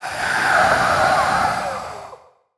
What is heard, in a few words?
Zombie Throat, Monster Sounds; The Decline Of Warriors; Monster Sounds Volume II